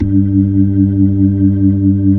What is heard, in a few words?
B-3, Keyboards of The 60's and 70's; Club Xfade